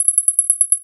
Multiple UI Beeps

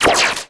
Sci-fi Laser Gun Blast

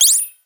Single UI Beep

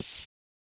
Soft Slide Fast

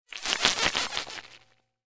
Oscillating Sci-fi Transition

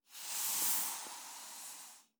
Spray Hiss

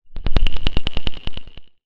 Sci-fi Organic Rattle

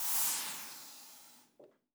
Spray Hiss

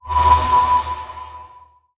Sci-fi Transition

